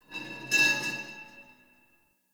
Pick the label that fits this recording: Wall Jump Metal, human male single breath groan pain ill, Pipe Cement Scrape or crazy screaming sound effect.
Pipe Cement Scrape